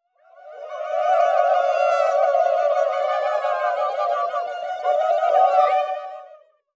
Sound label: Human Crowd Indians